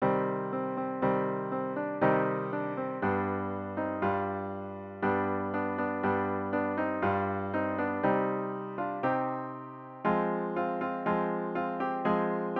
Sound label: midi